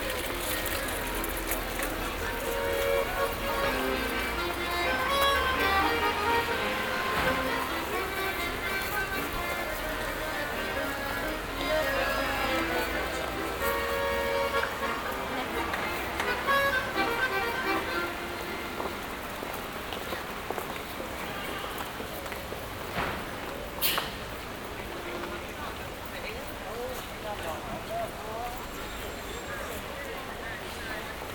Accordian Busker Belfast; Sound Scapes

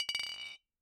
Bottle Struck

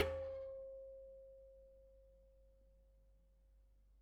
Bicycle Spoke

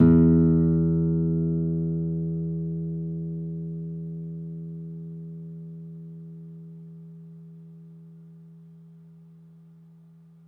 Open, Classical Guitar

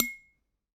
Aluminium Tube Hit

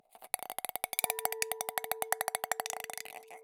Ping Pong Rattle, Large Wine Glass

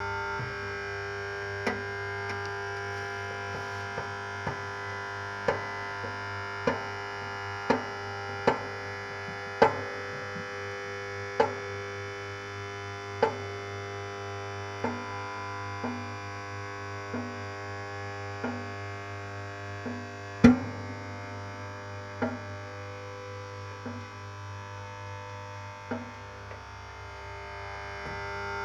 Hum And Pings Loop, Toaster; Kitchen

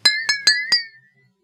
Water Clinks Mono, Glass Clink In Water

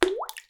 Plip

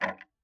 Tick, Clocks Kitchen Clock

